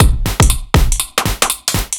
Kit 2 Heavy Swing 120; off the grid

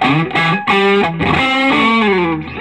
Vintage Blues Guitar, SOLO

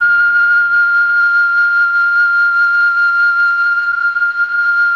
MELLOTROF

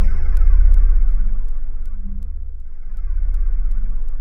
C1, Synth Leads

